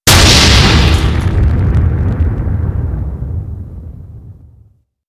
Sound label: EXPLOSION